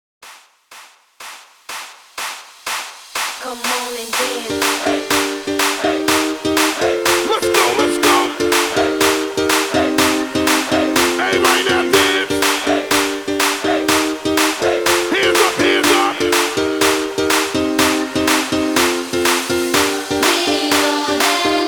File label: PARTY STARTER 123 BPM